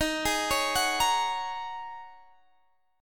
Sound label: G sharp 9th Suspended 4th; x,18,18,18,19,18; chord shape